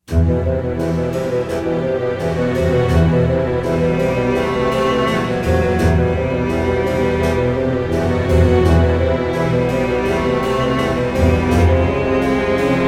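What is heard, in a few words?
Campaign Music; Heroes of Might & Magic III HD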